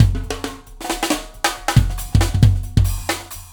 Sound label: Warp Drive Beat, Processed Drumkits